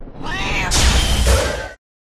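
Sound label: Pet Manul buff; manul lvl 2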